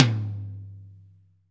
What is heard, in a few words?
TOM TOM, Real